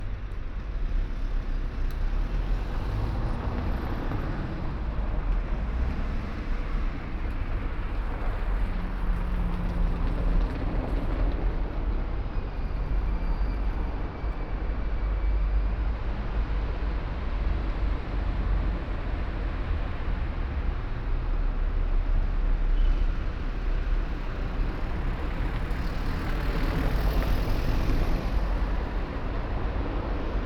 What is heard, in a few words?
Rua da Alfandega, Lisbon